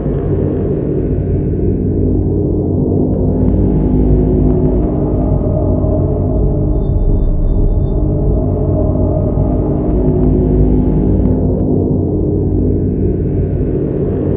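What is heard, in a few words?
eerie ambience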